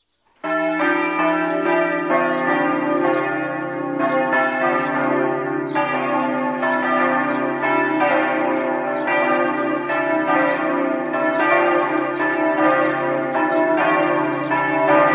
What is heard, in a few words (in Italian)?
reana, campane